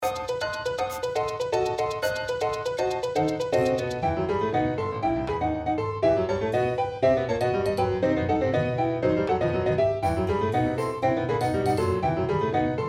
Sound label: Theme for Documentary